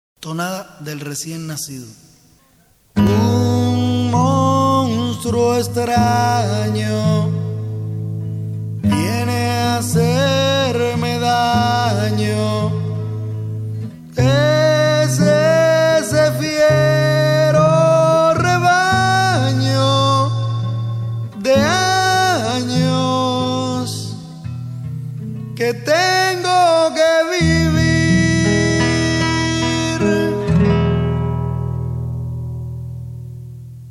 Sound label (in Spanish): tonada; Tonada del recién nacido; En 1994 grabé estas canciones en casa de un amigo en Cuba